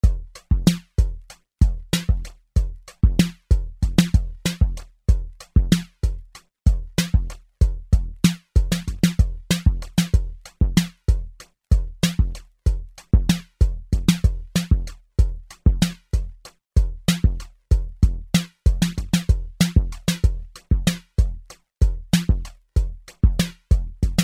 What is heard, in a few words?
Just Drums; Chuck's Zombie Petting Zoo; Marr0w Productions